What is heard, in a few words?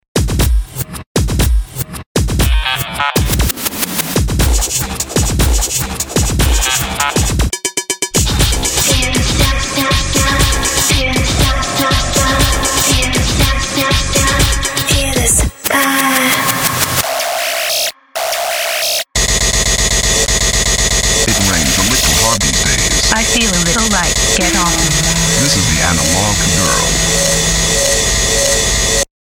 intro analog